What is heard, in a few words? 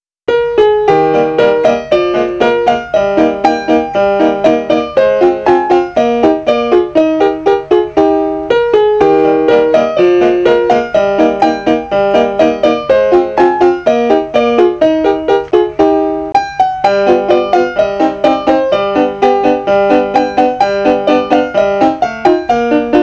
music brisk, 音樂循環; Scratch1000圖庫